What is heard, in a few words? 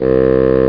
bassoon; Isaac Asimov's The Ultimate Robot (1994)(Microsoft Home)